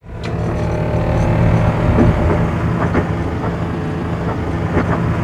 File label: rotate; abrams